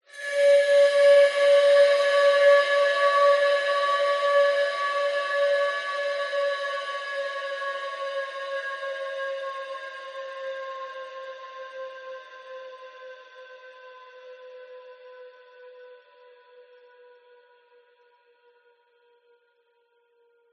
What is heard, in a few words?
horror accent tense chilling fear shrill metal scrape processed; zapsplat